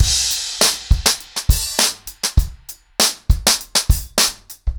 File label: 100BPM, ACOUSTIC DRUMS